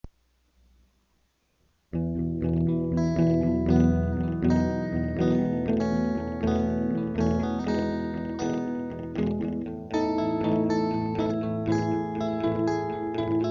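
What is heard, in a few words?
instrumental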